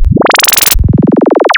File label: AM Sweep, wavetables; Architecture 32